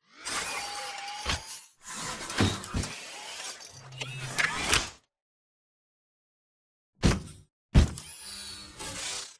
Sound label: Victory Authority; Coliseum; Titanfall 2